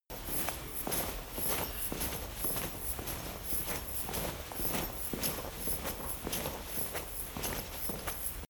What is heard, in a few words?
chain mail walk